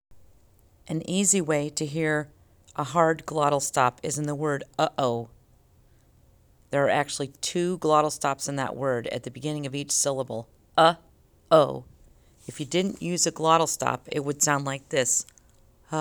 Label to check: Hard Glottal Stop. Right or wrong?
Right